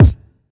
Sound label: kickdrum sample F sharp key; kick drum samples